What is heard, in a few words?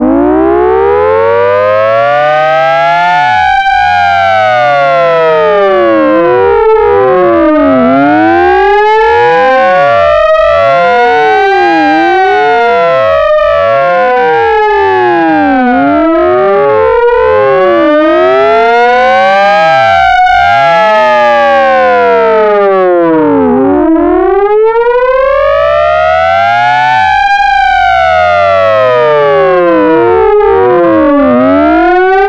civil defense alert siren